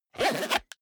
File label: zipper small case close fast